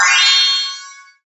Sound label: sparkle